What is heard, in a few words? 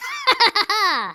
laugh; Biggles